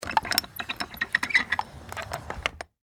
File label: Household Window Open Lever Crank; Cleaning